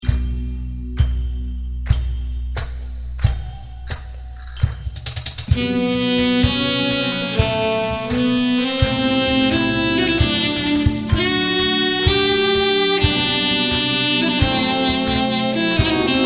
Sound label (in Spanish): Seguiriya Eb, flamenco sax